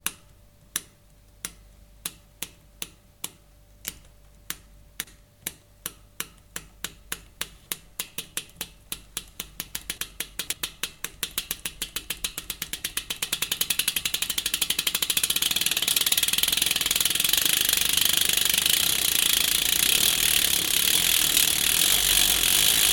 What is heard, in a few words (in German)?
freilauf MTB; freilauf huegi